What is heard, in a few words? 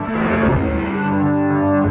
Burning, Electric Guitar